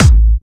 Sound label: Trance Kicks, Bassdrums